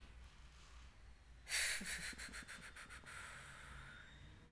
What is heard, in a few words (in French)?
SOUPIR; Hadjithomas Joreige